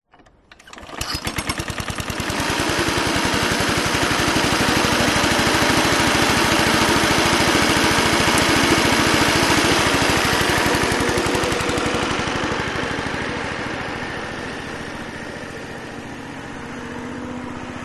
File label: lawn mower petrol Ransomes Automaton Certes 18in Passing; selectmood